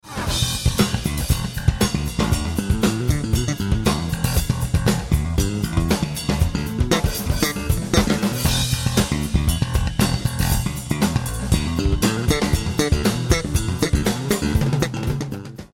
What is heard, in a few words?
bass